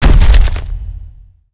drop, giant desert turtle

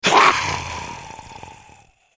small die, voice

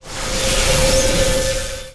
spell archer observer cast

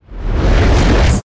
spell magician dark force cast

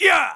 warrior voice soft

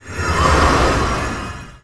spell archer haste

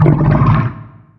run, giant desert turtle

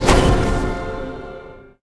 spell holy down; magician

